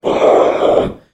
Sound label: Demon; little robot sound factory